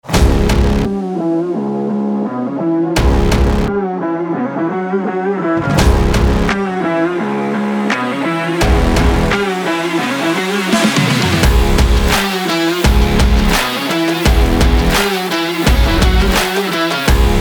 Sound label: powerful energetic sport rock trailer